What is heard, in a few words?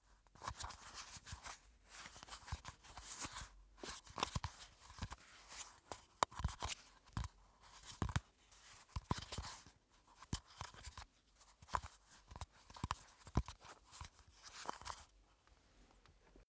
makeup box stroke handling; haus-garten; badezimmer